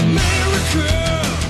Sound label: fire, weapons